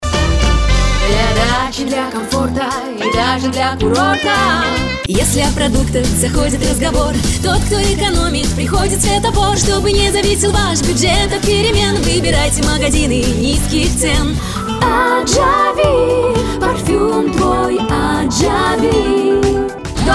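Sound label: vocal Russian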